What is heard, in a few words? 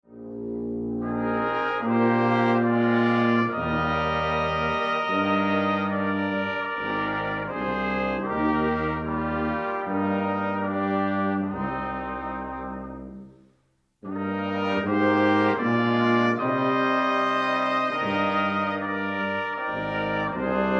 irish tune, brass